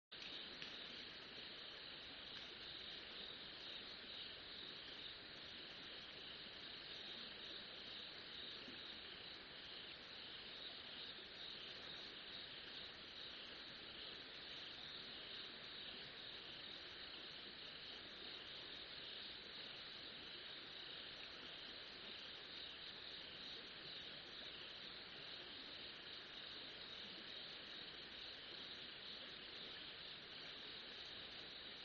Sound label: riverside; Kyoto U; Ashiu